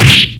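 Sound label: Battle Hand; Etrian Odyssey III HD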